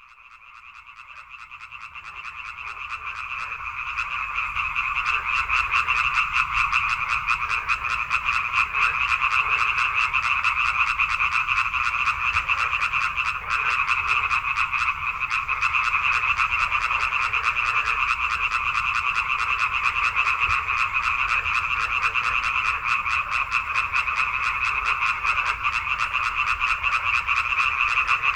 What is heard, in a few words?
frogs croaking; animals; best service Blue Box